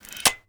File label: double shotgun bolt back; Rust